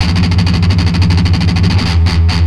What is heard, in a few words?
Riff, Industrial; Nasty